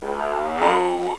mooo

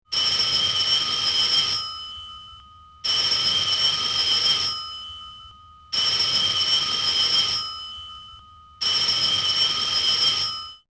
old phone

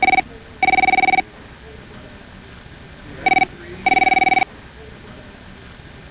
phone

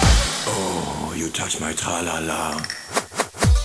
dino tralala text

